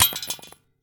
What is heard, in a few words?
grenade metall; STALKER; IWP